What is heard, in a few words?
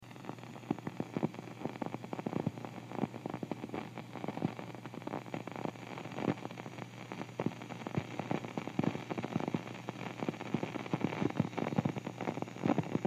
Fender static